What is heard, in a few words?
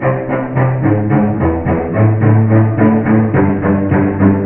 strings chug along at a snail's pace, sad; Loops